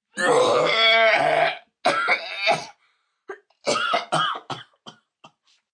Vomit Cough, Choking, vomit, 1 child, loud, coughing, heaving, human voice, kid; Vomiting Sound Effects